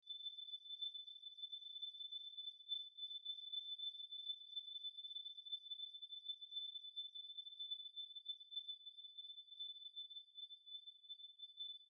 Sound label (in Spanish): gorriones; berna; choir of species